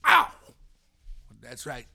Vocal, 70s Analog Funk

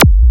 FX, attacks

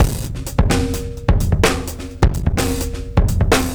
Jazzy Drums 128; musicradar

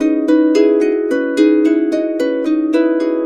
SWI, SWIRL, Complete Harps; SWIRL 110; USB Soundscan vol.62; AKAI

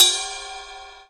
Ride, E-MU Proteus-2000

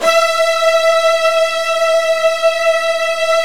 Virtuoso Strings

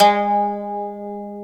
M. KOTO G2 A, ETHNIC; AKAI S-Series CD-ROM Sound Library VOL-8; SET#1 ETHNIC

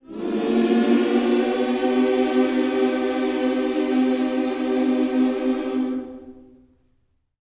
MINOR, MIN.SCOOPS, Vocal Planet, Gospel; Vocal Planet Vol1 Gospel

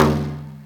BENDER, ETHNIC PERCUSSION; AKAI S6000 CD-ROM - Volume 5

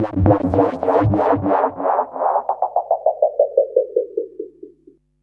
bass delay fx; Drum&Bass; Instrument Samples; EZRollers

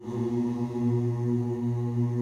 C1 MALE CHOIR; CHOIR OOS

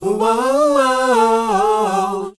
SHOO B, D, Vocal Planet R&B & Dance; ILIO; Vocal Planet VOL-4; SHOO BE 80

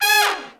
SECT BRAS FALL

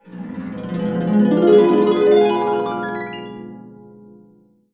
D DIM7 CA UP, CASCADE UP, Complete Harps; USB Soundscan vol.62; AKAI

